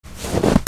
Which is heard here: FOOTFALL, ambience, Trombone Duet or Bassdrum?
FOOTFALL